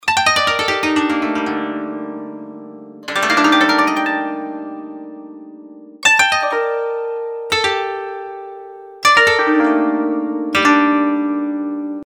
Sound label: koto